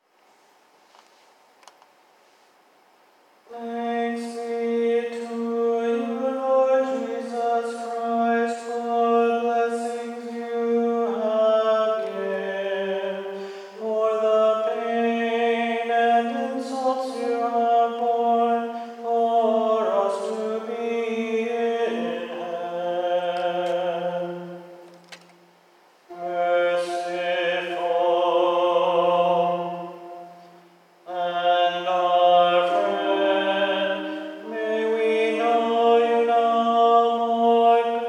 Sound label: prayer of st richard tenor